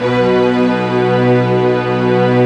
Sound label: x Synth Str, Roland; Club-50 - Foundations